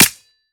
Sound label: melee world knife cement; viper